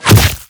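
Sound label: assassinate damage, sniper, weapons; assasinate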